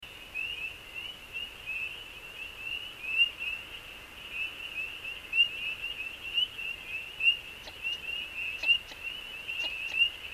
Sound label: Shoveler Sound